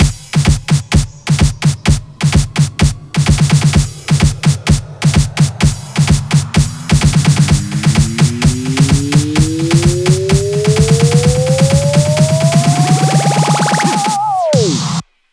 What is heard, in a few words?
128 BPM; Meloman; 8Bars; Vengeance Samples; House Electro Fills